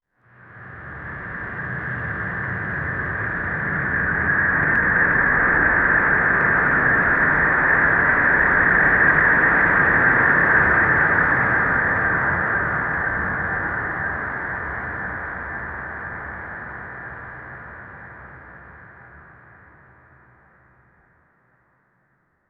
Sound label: atmospheric soundscape